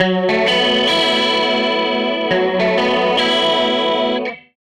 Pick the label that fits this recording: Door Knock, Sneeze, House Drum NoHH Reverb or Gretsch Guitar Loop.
Gretsch Guitar Loop